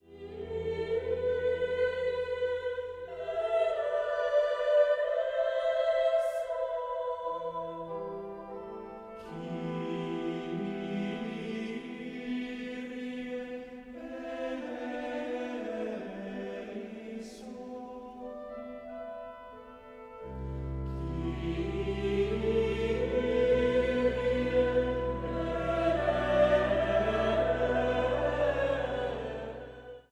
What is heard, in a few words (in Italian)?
voci